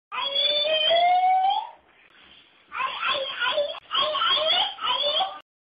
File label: a kid calling